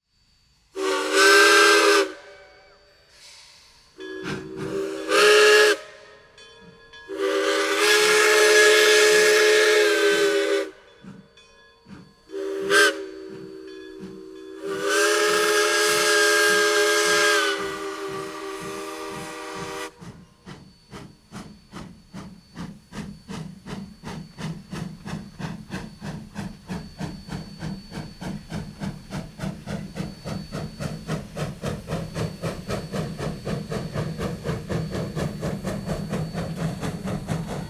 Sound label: trains; sonomar collection